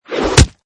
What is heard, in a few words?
Arrow Impact Human, Archery: Arrow hit, impact into human or object; Bow And Arrow Sound Effects; Lynne Music